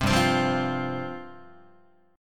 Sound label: G 7th Suspended 4th G down Guitar; G7sus4 strummed